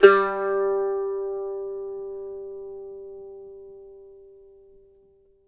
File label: MANDO NOTES, G3; Sampleheads; Whole Lotta Country 1 & 2